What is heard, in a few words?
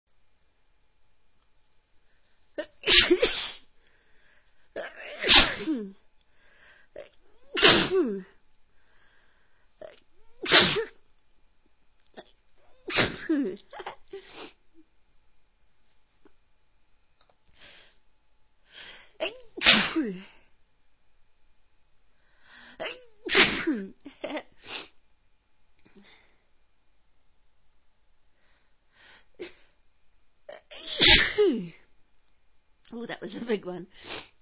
allergies, female